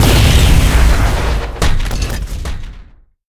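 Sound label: boss death, monster; steamer crazy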